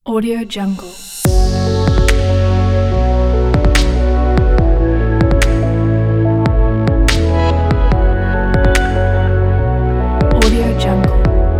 technology background; studio-39

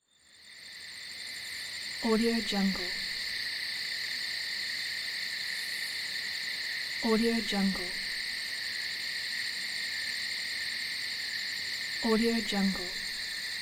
crickets at night

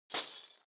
debris impact glass

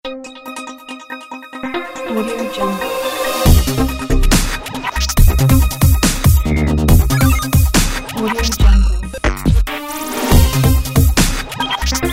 hi tech intro logo

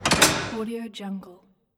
door latch close

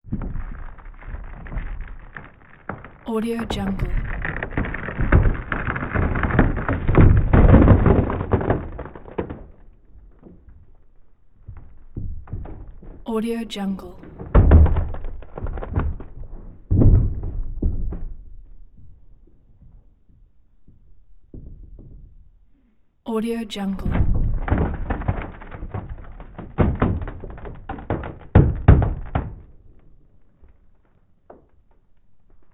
wood crack thick splintering bursts creaking

